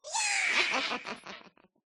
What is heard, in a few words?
charge, vex; Minecraft